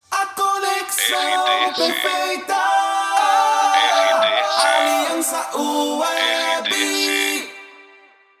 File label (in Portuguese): ACAPELA; Aliança Web